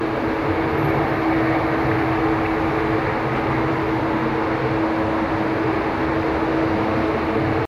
Back Alley Ambience

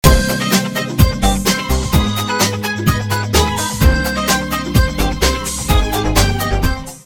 Disco; Bucketz

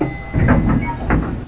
Elevator Open

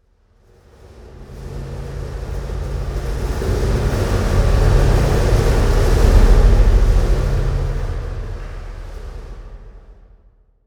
environment temple winds stinger indoors

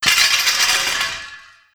Scaffolding Break; angry birds rio

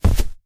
Jump; Mo Genie Balerina Jump 1; Doodle Jump 2; Android

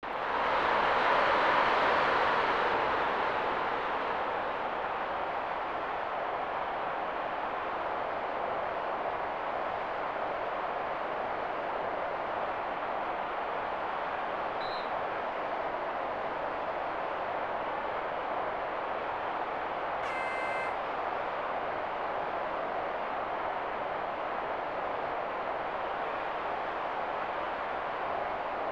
Crowd; WWF Raw; SNES

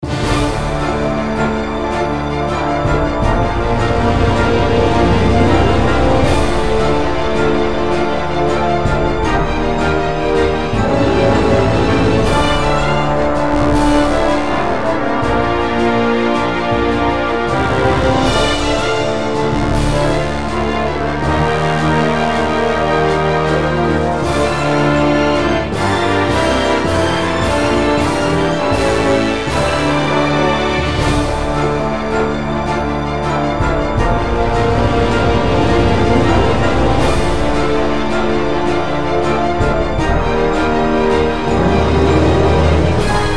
Theme - Orchestral

